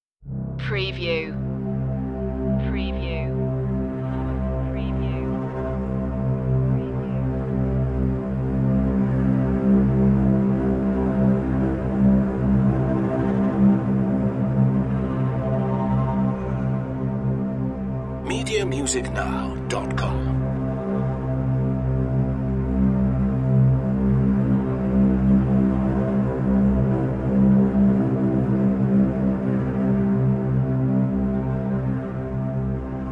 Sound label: strings in a dark place drones only